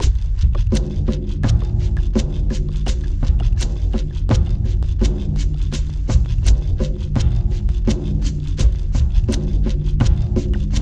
THUNDER GROOVE; Sonic Foundry (Sony Creative Software); Vir Unis Infusion

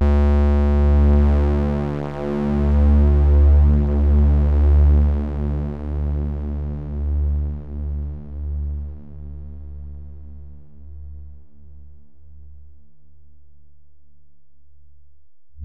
Bell, Alesis DM-PRO

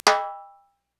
TIMB HI, Korg EMX-1, Drum Machines

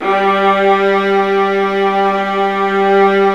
Roland String Sections Violas; Roland LCDP13

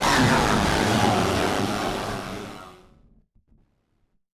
Malfunction Synth, malfunctioning synth samples

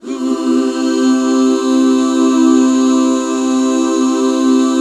C MAJ, Back Chords; Sound & Vision - Gigapack II CD 1

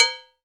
HAND BELL, Latin Hand Perc; E-MU Producer Series Vol. 2; More Studio Essentials; Composer

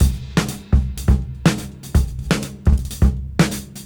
Disk 125 BPM; AMG - Keith LeBlanc Phat Butt Jams

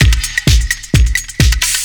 slurp; Zero-G Deep House; 130bpm

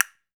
Dance Drums

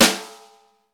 snare, Roland; Club-50 - Foundations Roland; Ext.Snare 3

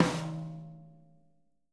SNR, Living Drums; Sampleheads; Peter Erskine Living Drums; JZ XTOM 1

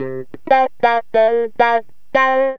GTR, CHANK; Zero-G; Funky Elements

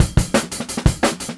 175, Breaks; Drum&Bass; EZ Rollers

